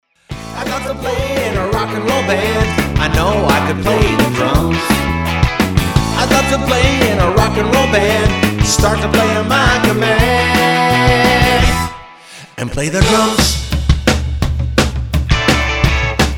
Rock And Roll Band

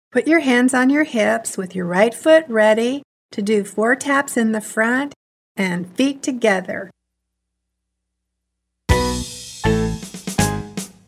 4 taps & step

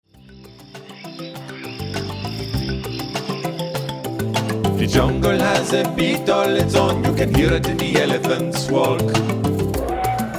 Jungle Beat

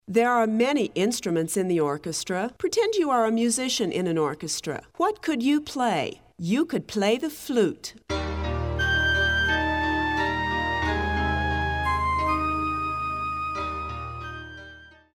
Orchestra

